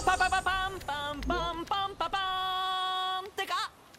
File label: Victory; Prompto